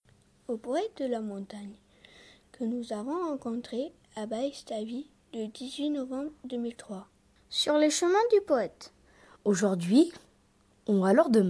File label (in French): musique